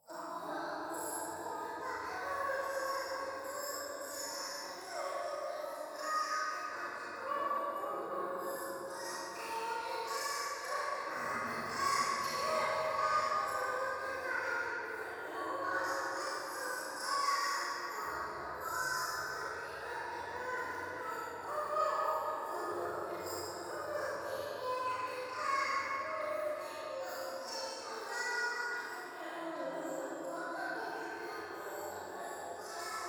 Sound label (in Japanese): 廃墟 低音喘ぎ